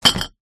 household recycle bin glass bottle drop in on others